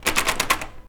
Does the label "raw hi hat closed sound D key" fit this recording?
No